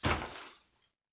put pucks

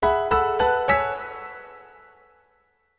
sound logo

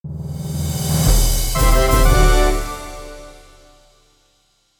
fanfare

